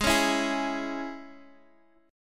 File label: Ab Suspended 4th Sharp 5th Ab, Guitar Standard; 16,16,14,13,x,x; strummed; JGuitar